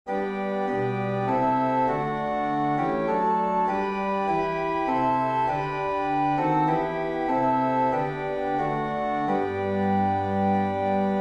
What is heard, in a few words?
Hymn National Anthem Organ; God bless our native land; Hymnal 1982